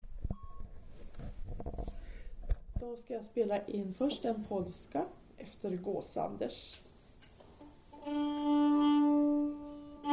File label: polska, nyckelharpa; efter Gars Anders